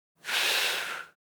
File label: Human blowing short